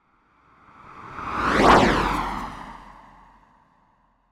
transport car pass fast L R wet road; zapsplat

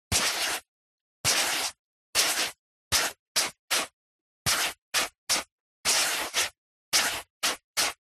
Handwriting Sand Medium; D&H; soundbox library

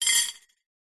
household cutlery handful set down on plate; zapsplat

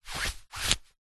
foley fabric thick short movement; zapsplat

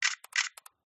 Burst shoot; PMSFX

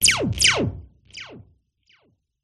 Blasts Synth Delayed Oneshot; sound spark

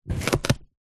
food courgette chop slice single knife

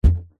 balloon inflated catch

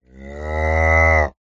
animals cow moo

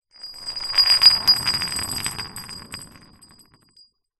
metal bar small roll hard ground; foley

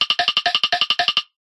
cartoon run scramble fast wooden vintage

